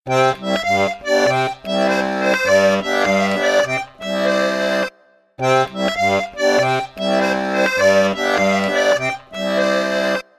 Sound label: accordion